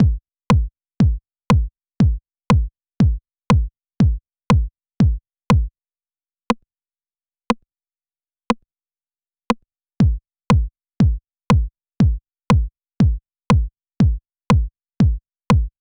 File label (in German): Rim plus Kickweglasser